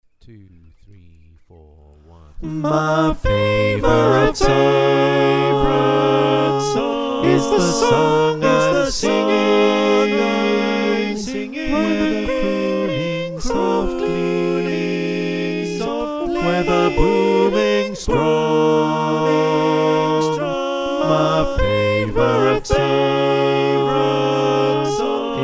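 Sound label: soprano alto tenor bass, choir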